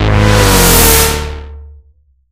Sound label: player died; video games; source engine; tf; mvm